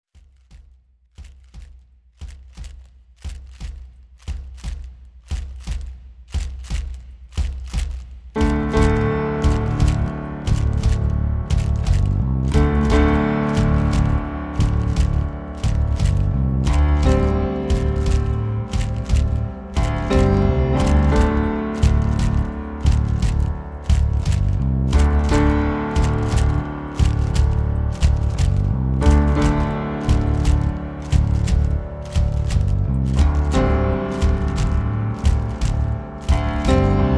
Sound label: drum spirit